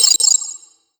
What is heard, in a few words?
Name Cancel